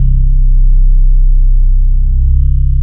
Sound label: BASS, Drum & Bass, 160 BPM; Ueberschall; Drum & Bass Resonance